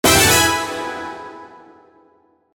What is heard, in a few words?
JINGLE